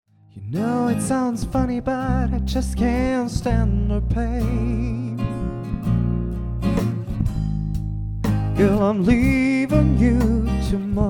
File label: Easy Bass